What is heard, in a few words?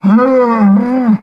Cattle, Cow Bellow. Single Large Short Bellow. Close Perspective.